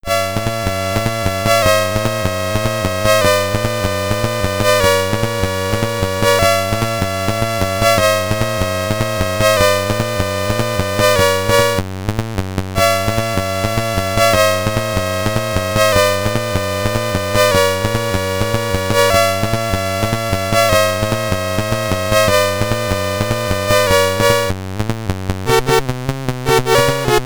Boss (SID Stereo); Wonderboy in Monsterland Commodore 64; Boss 1 (Normal)

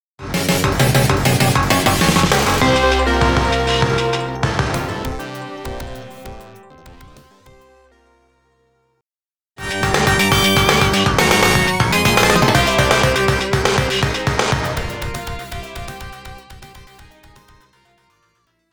FM Sound Source Rhythm Fade-out Sample; FM Sound Source Rhythm Trade Fade-out Sample; X68000; Gradius II Gofer no Yabou

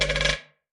monster Noise; Lethal Company; Windows

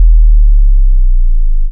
Bass

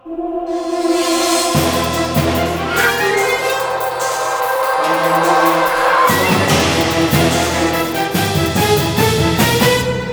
Car crash; Jet Li Rise to Honor; playstation 2

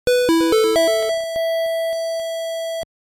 Horn; Shadowgate Classic; GBC; Platinum

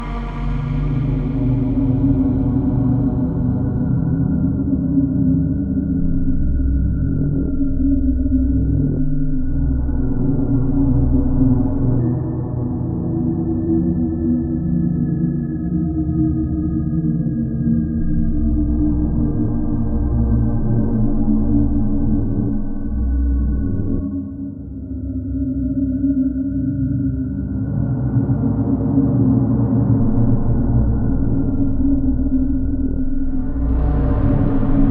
Ambient; Vacuulus

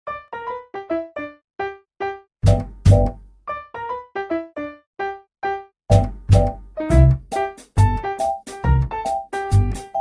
Musicbox; Garfield's Diner; Android game music

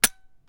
no ammo

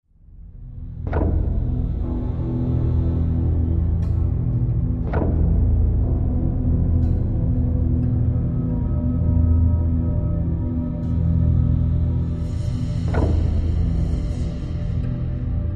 Loop Spooky; tales from the borderlands gamerip 2015

